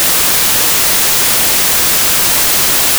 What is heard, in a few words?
white noise; reference tones